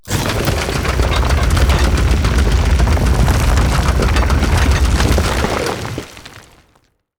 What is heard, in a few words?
archeology event drilling; ancient relics